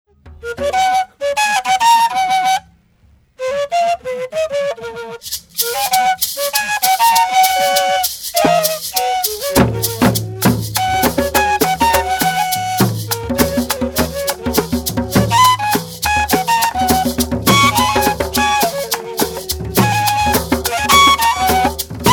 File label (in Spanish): gaita negra